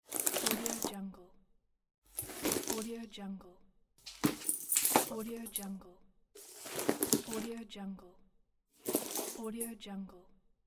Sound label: wear purse